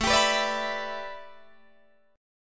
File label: A 9th Suspended 4th A, Guitar DADGAD